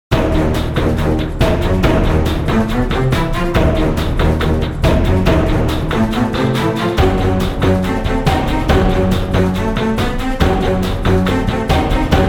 suspence; Chrome

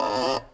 Squeak

